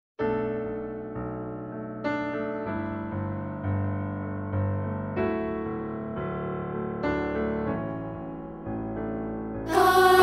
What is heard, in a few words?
piano